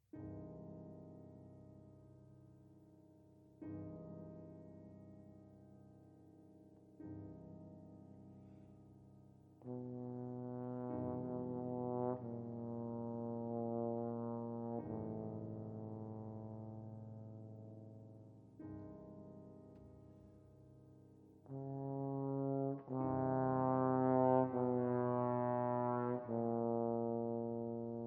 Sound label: Four Pieces For Clarinet & Piano - IV. Langsam